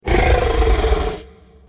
growl, trex; Crossy Road; dinosaurs

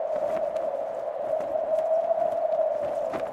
kite; Vex 7

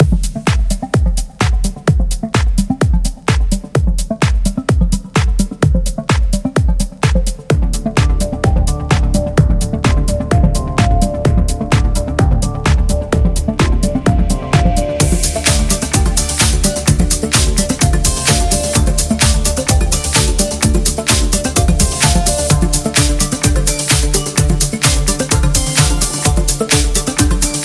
menu song; vex6

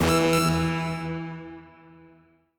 two minute warning; 2drocketleague

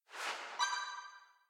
hits; Skibidi Toilet Attack

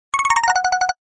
Access Granted; bobtherobber2

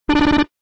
Access Denied; Bob the Robber 2

